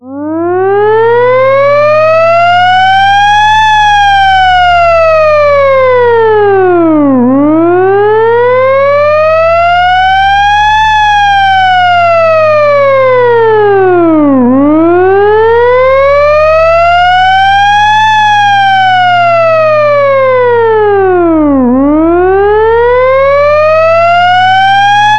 air raid mechanical siren

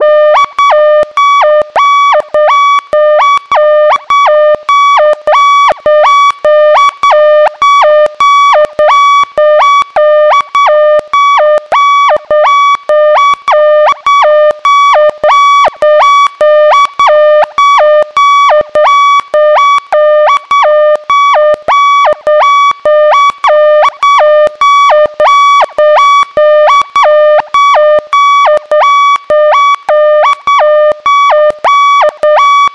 warning alarm siren

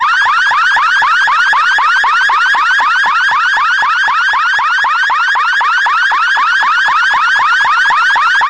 police piercer siren

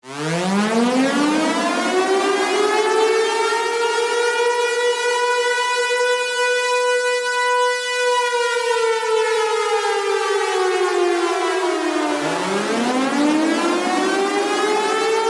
police wail siren

